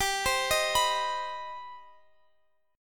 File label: C Minor Major 7th G